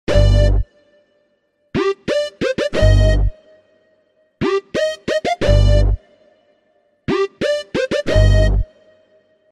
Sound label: beats tone ringtone; Category Galaxy